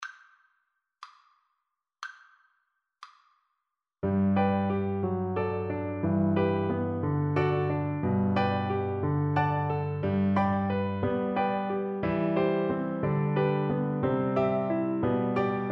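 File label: lieder VLC karaoke, Cello; brahms; 5 lieder o liebliche wangen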